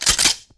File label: m3 pump; weapons